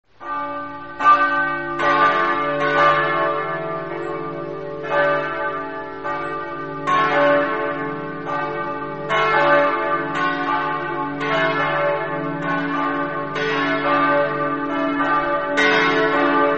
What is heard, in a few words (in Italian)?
sacile, campane